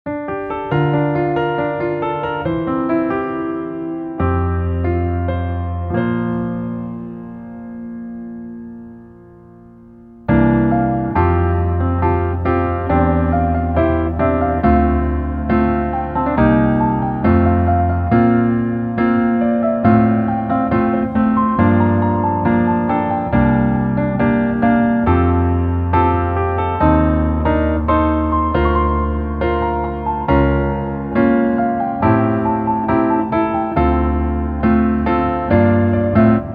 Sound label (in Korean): Piano ver, 멜로디MR -1키